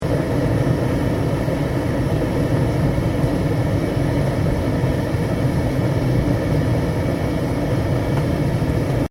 HOTPLATE GAS PALOMA OPERATE LOOP, Portable gas cooker; Kitchen (Sound Effects:Household)